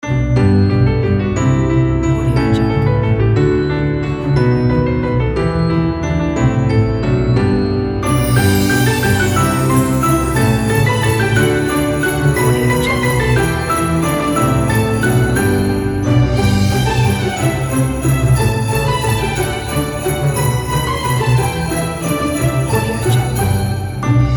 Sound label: we wish you a merry christmas kit